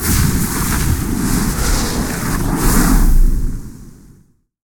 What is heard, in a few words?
near Win; hellskitchen